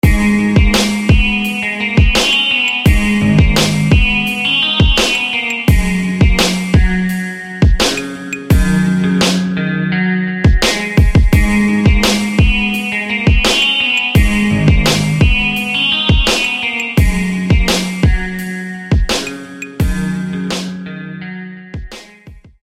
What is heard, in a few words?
Axe Flame; SFB FX